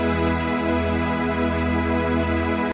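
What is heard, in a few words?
mi major; playback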